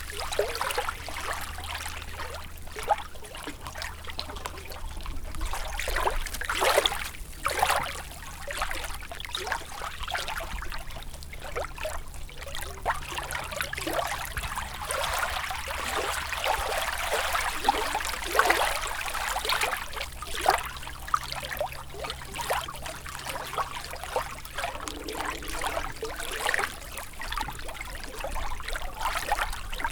Water Lapping

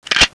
lock

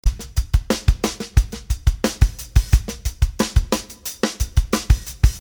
drums medium reverb

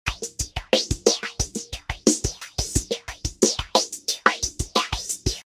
drums phase washy lr

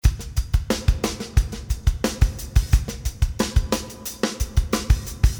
reverb drums hall

